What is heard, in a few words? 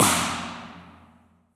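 Nancy Lake Tunnel, Impulse Response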